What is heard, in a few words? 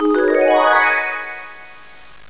portal